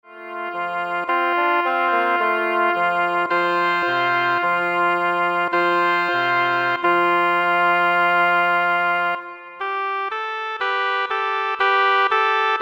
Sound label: Double Reed Ensemble Frere Jacques